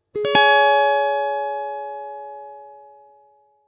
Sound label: jubilation, message tones